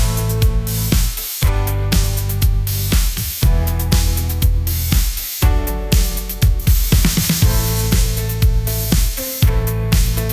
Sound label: answer the call pop groove, ringtones; ai